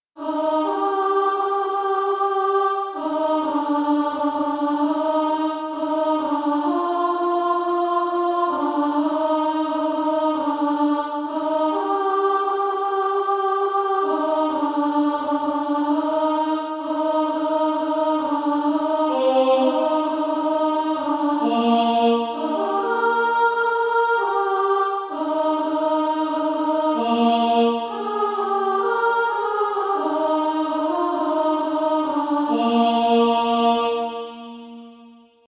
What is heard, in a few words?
Female Choir; A Child of A King